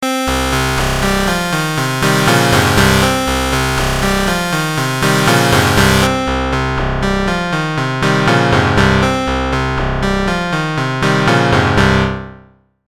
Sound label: sncFM